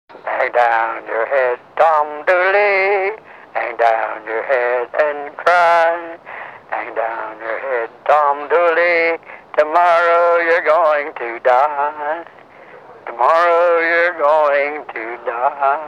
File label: Pop Music